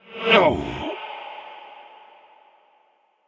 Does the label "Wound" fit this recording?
Yes